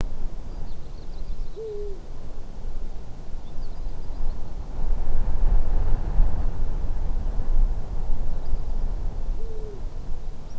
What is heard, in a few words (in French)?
Grand duc d'Europe; Ossau